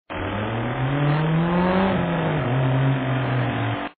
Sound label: gas, cars